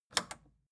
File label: record player power click on slow